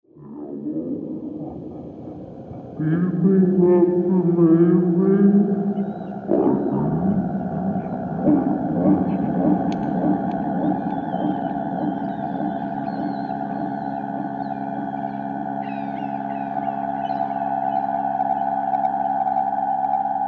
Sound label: Noise Band